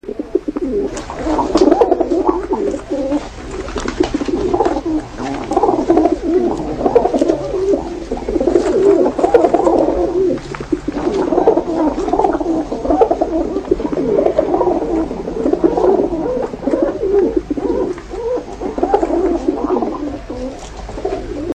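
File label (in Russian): zvuk vorkujuschih golubej, sound of cooing pigeons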